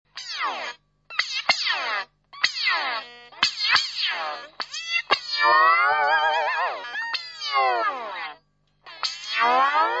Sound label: meatball; psst